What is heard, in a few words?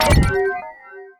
Slow step; Pc skill; FlyForInsanity